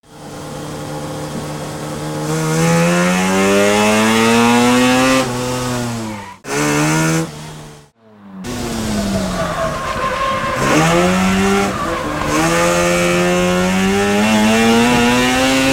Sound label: bridgeport